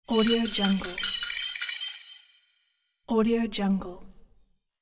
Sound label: futuristic device chatter